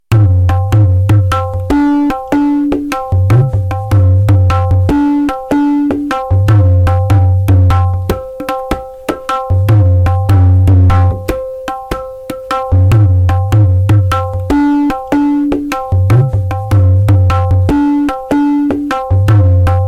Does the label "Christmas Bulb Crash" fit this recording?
No